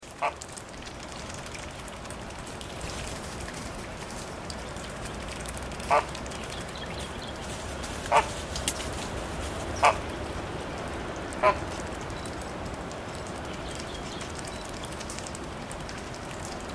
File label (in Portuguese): canto do flamingo americano